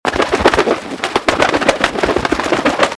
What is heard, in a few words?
Dracula Flap Wings; Quicksilver